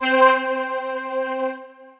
Voice Layered Ensemble, Roland VP-330; String Machines; Ableton; Factory Packs; Retro Synths

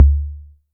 cmos attacked, Kickbank; Ableton Foundation FreePacks

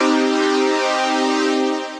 Light Ray, Pad; Ableton; Factory Packs; Punch and Tilt

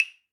Claves; Latin Percussion; Drum Hits; Wood; Ableton; Factory Packs

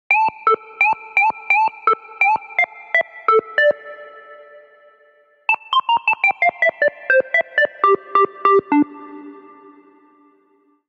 Sine D Minor 88 bpm; MIDI Clips; Tonal; Melodic; Ableton; Factory Packs; Chop and Swing

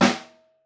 Room, Snare

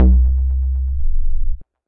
Lofi Deep Bass; Ableton; Glitch and Wash

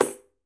Pandeiro Slap; Latin Percussion; Drums; Drum Hits; Tambourin; Ableton; Factory Packs

